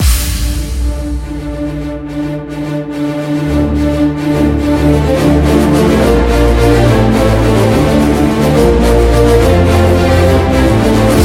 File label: music